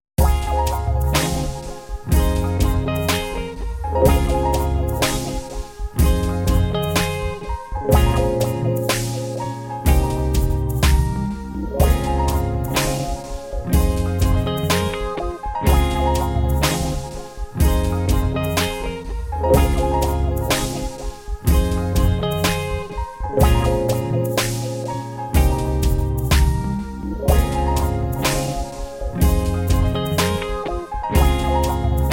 r and b comp

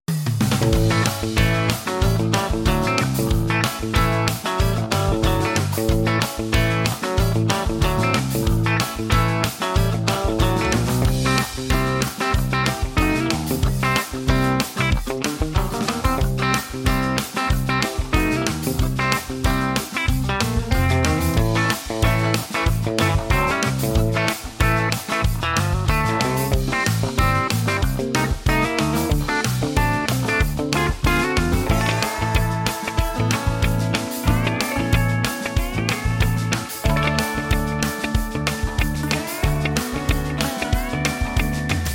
country comp

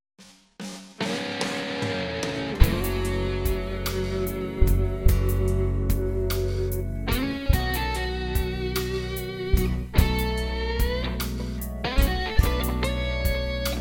slow blues

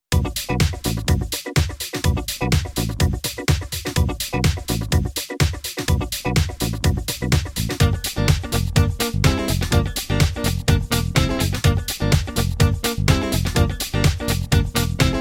funky comp

